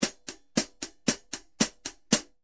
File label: HH ZBT 14 RPR 14, rock hat